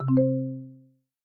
Button Spin; Real Dealer European Roulette Classic

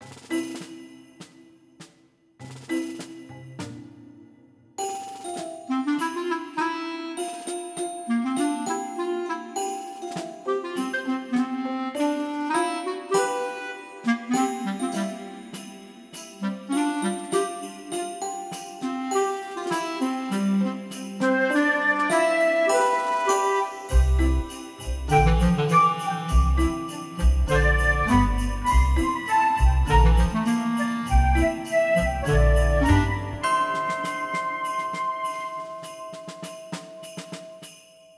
Base Music Loop; Mahi Gaming; holly Jolly Penguins

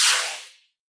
shield pothole; Skillzgaming; mega Money Rush Desktop

